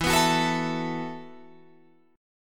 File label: E Suspended 2nd Suspended 4th E, Guitar, Open F; Esus2sus4; strummed; 16,18,18,16,19,16